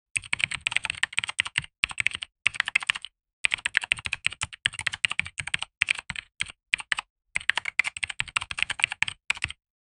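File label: mechanical keyboard, typing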